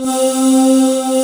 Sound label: AIR WIND C4; WIND #2